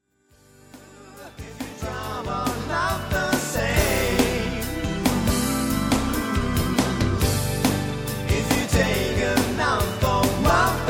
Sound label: VOCODE